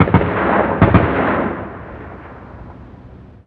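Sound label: gun 50cal distant; ModernWarfare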